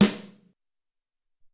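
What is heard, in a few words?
Full Snare, Alesis SR-18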